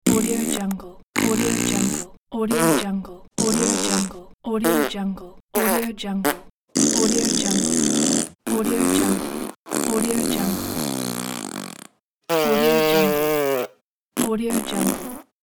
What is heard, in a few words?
fart